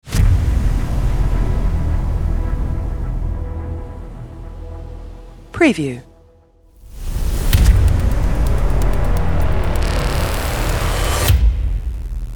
Cinematic Experimental Hybrid Action Trailer Music